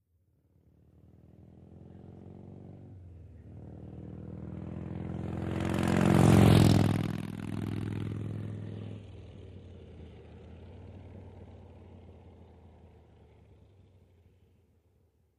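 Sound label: Motorcycle Harley By Medium; harley-davidson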